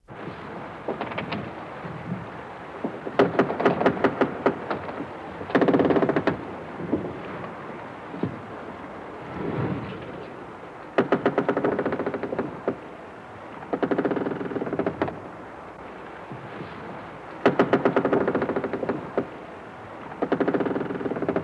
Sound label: Creaks Tug Boat; boat creaks & bangs